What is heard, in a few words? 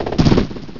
Pluck; pokerogue; battle anims